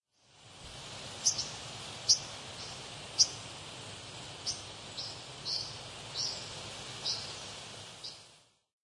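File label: Brown Dipper; kawagarasu; nikko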